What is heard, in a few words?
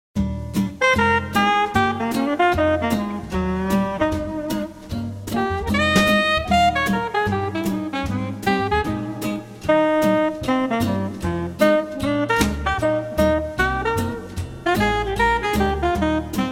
Happy Music Vibes